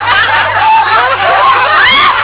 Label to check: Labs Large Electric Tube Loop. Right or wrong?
Wrong